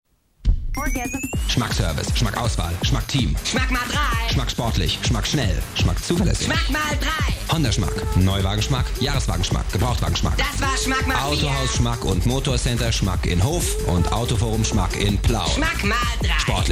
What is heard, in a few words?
Rap